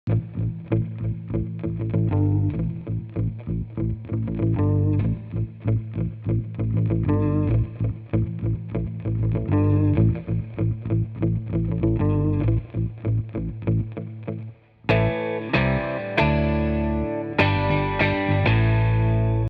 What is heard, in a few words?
Pedal Steel Lick Backing